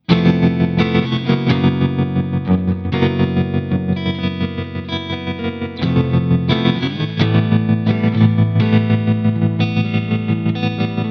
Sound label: TREMMATIC SQUARE ZIPPER